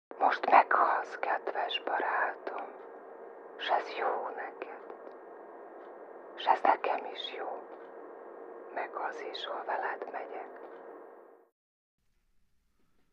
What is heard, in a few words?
best of disco